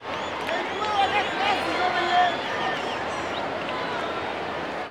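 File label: baseball crowd ambience chatter; SFX Kit; Crowds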